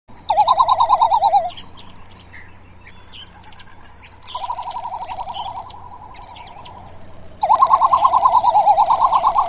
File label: Fregata minor, water birds; Chordata